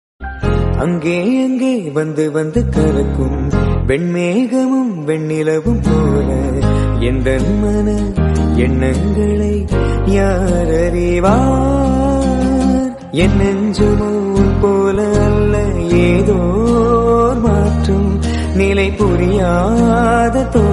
love song bgm

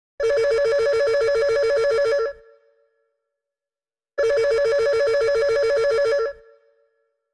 80s phone ringtone tone and text alert

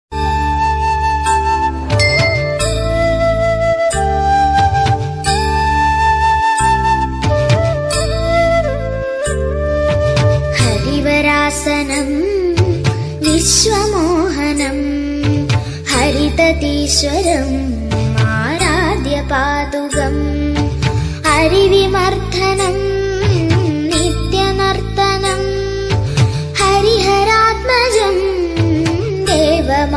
harivarasanam female lord ayyappa ringtone

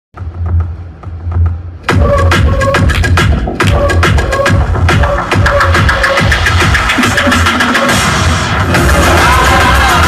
interval bgm ringtone; mathu vadalara 2 movie bgm

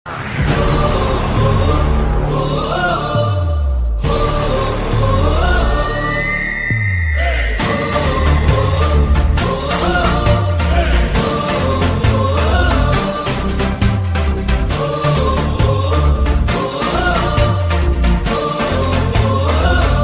masterpiece bgm

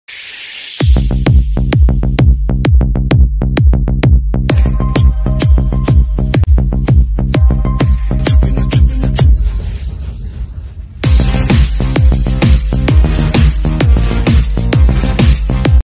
Song BGM Ringtone; kanguva

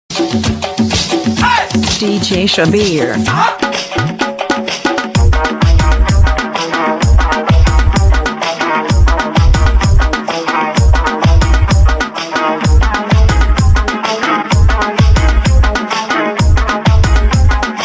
telugu dj songs, teenmar dance; girls dance; super dancer

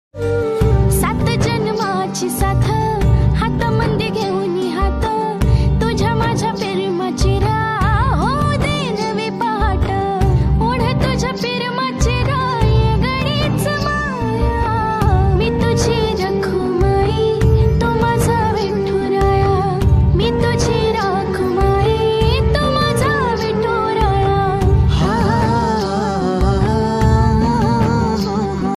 marathi song ringtone; मरठ रगटन

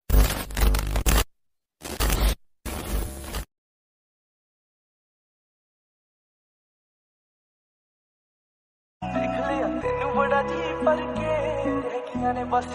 lofi remix ringtone, punjabi ringtone

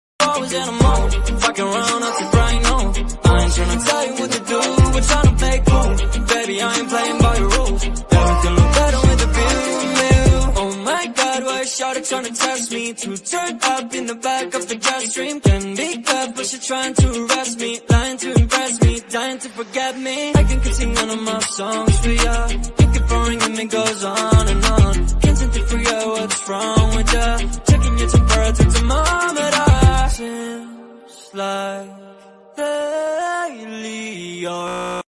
remix ringtone bgm mashup

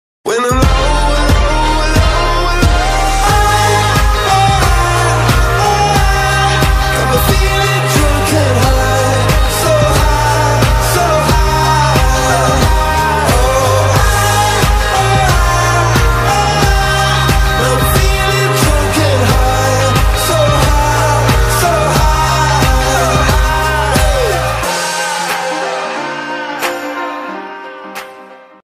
ringtone english song bgm

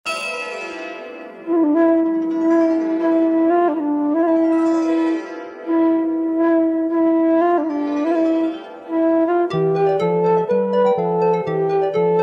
flute music valaiyosai flute

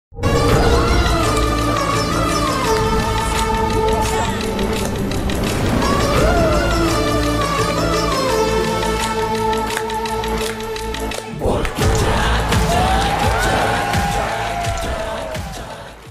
entry video bgm; bigboss kannada season 9; kiccha sudeep; vikrant rona

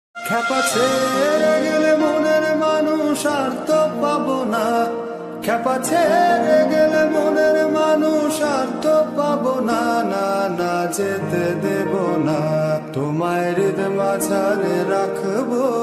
rabindra sangeet ringtone bengali folk ringtone

